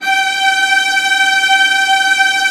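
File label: VIOLAS A5, Strings; ILIO; Synclavier Strings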